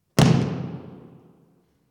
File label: One Heavy Thud